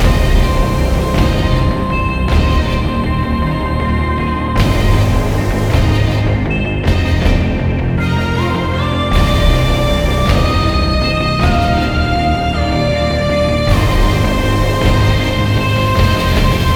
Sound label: music loop; Golden Crayon Sounds